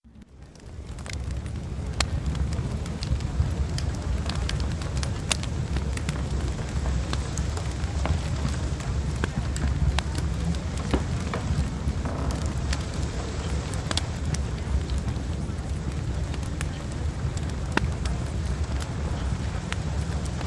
Fireplace; Premium Subliminals; Success With Men

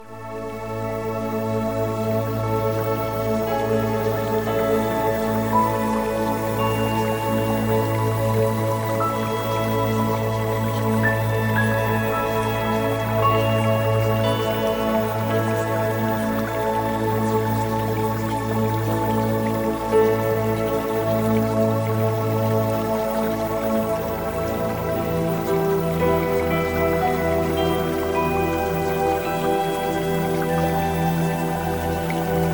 Music; Premium Subliminals; Success With Men

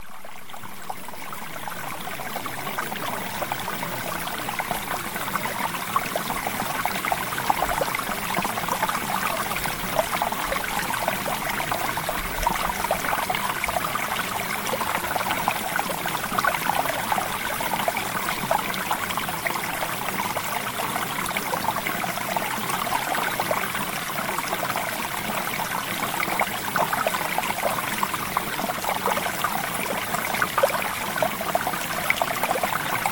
Water

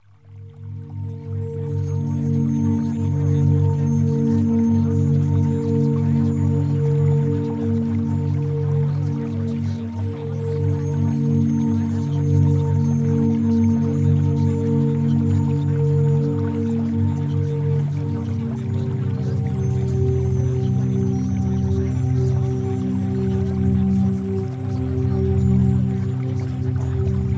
Music; Premium Subliminals

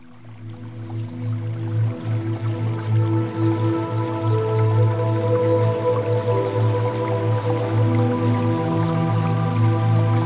Music Theta Waves; CHAKRA HEALING

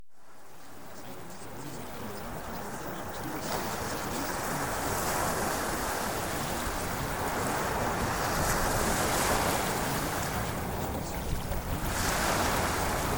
Ocean Waves; Travel Adventure

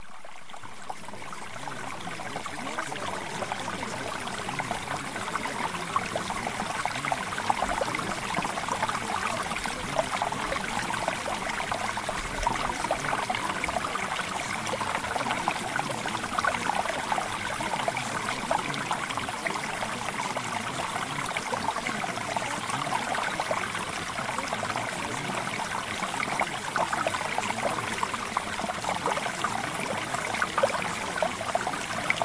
Mountain Stream

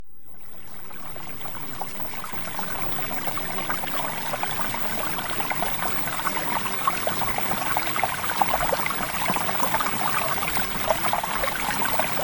Water; Premium Subliminals; Success With Men

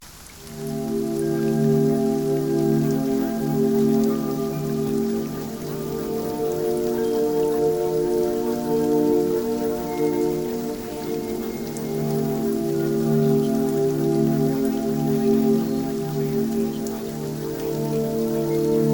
Music

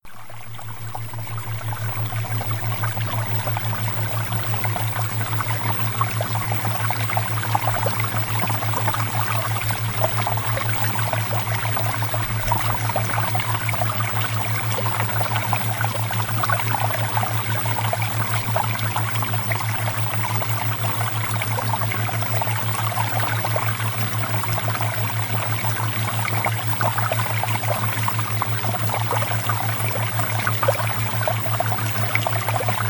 Water Theta Waves

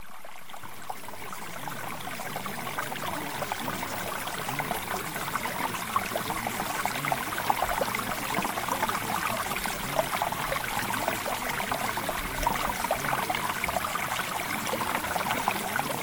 Mountain Stream

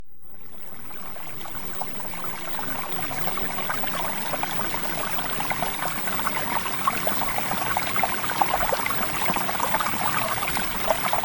Water; Premium Subliminals

